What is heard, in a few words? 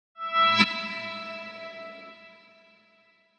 ding